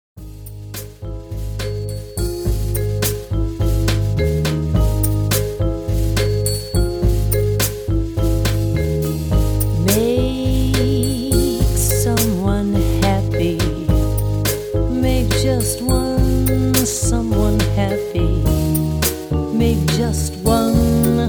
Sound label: quartet vocal